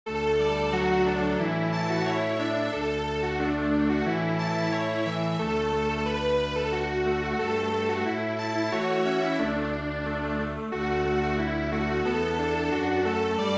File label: Strings Quartet, traditional